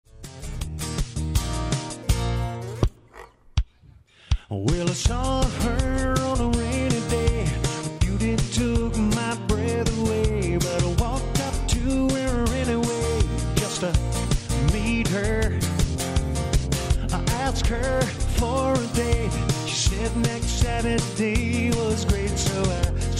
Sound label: LIVE, Acoustic Guitar